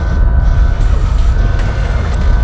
mech engine

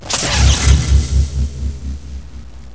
correct

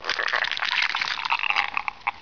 decomp; Hexen2

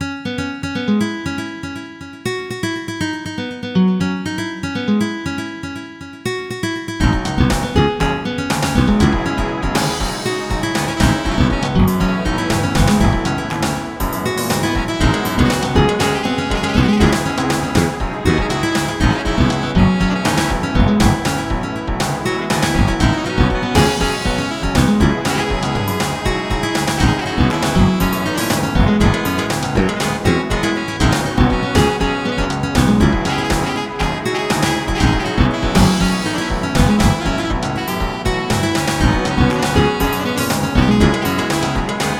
funk city

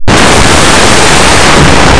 drawer

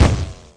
DAM HIT; lander

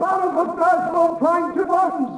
walla; DAoC

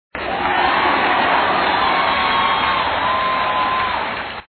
cheering; Together in Jesus - First Reconciliation - Computer Fun; word snatcher

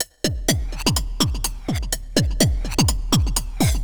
XTRA HOUSE 125

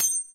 Bounce; Denkspiele; Pirates Of Treasure Island

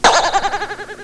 spring boing, cartoon

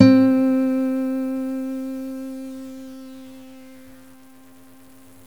Nylon Str. Gt; Guitar; GMPlay

